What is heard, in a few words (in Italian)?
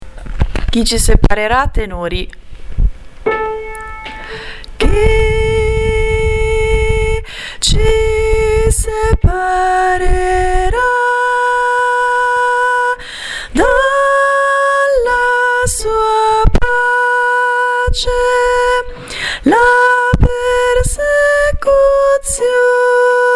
separera tenori